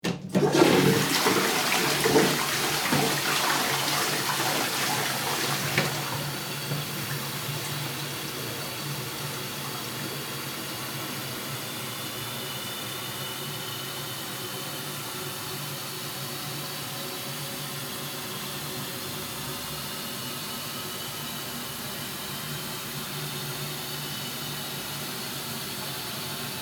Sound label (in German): bad toilette